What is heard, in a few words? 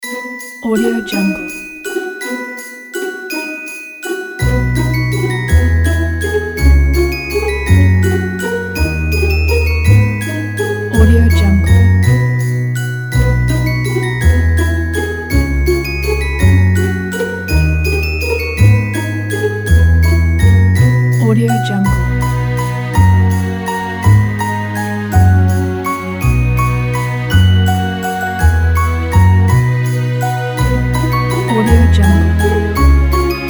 merry christmas orchestral theme